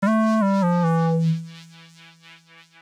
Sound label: Square, Akai MPC-5000; Internal Rom 2